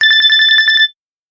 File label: Simple Beep, phone tones; LG C330 Etna